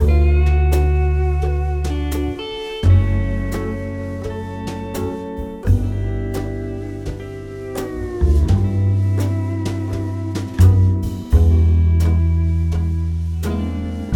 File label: hawaiian sand bed mellow